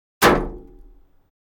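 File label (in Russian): удар по металлической бочке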